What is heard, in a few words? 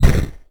stone golem move; Crypt of the NecroDancer